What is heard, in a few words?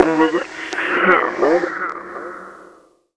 Dithery, Vox FX; Total Drum&Bass